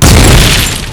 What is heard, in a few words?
tnt explode